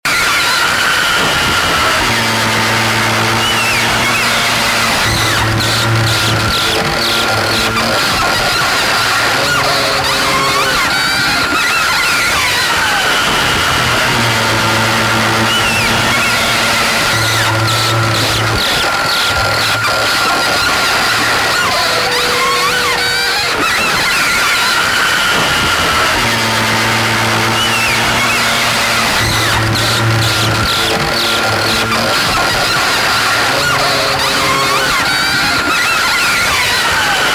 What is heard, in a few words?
open noise; kunstvlaai 2006